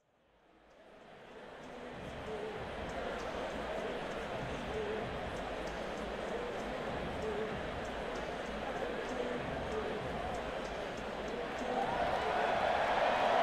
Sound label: Crowd, Madison Square Garden; New York, NY